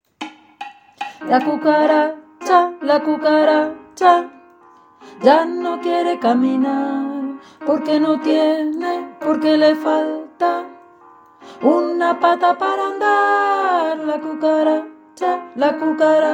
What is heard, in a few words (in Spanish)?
la cucaracha contraltos; Turín